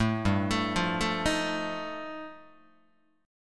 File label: G 7th Suspended 2nd Sharp 5th, Guitar; G7sus2#5 arpeggiated